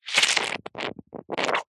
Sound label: foley liquid mostly foam froth bubbles suck in squelch